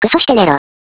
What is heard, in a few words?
alert